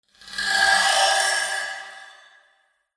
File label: ambient wood rattle